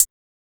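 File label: hi-hat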